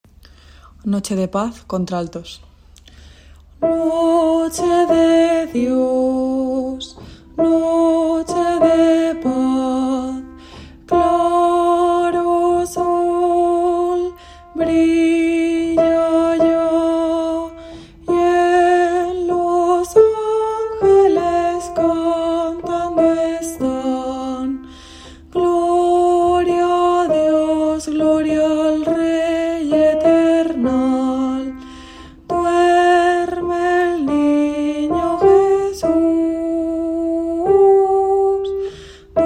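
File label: alto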